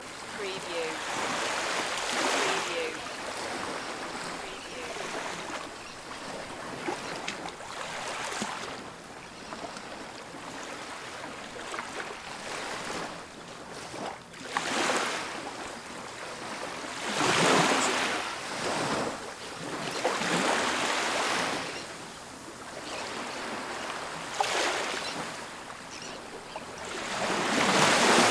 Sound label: Ocean Waves Near Cliff